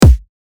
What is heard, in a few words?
kick